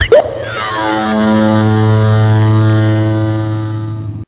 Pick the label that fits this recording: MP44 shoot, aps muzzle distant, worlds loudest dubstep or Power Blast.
Power Blast